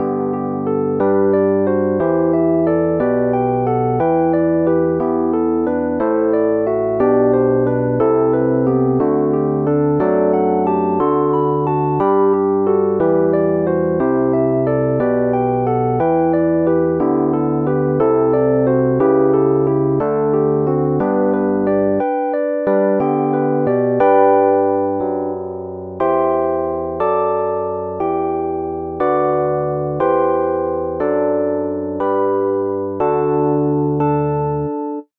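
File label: rhodes electric piano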